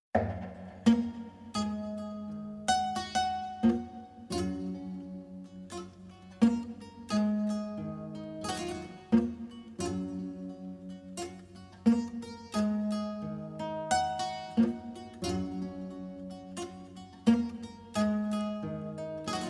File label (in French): joue un morceau de kora